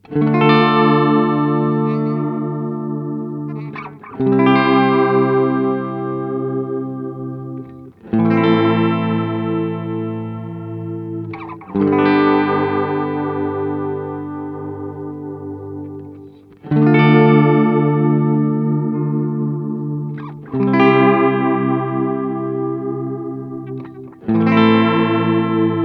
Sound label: Echosex 3 Pitch Modulation; Gurus